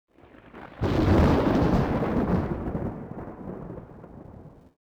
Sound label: thunder; Soldier Of Fortune II Double Helix; XBOX; ambience